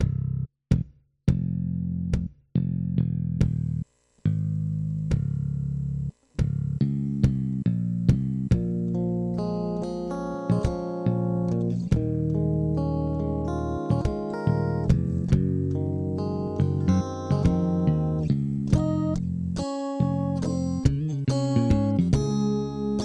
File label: guitar